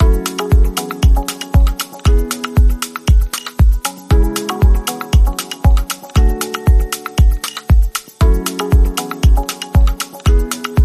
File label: Percussive tranquility Rhythm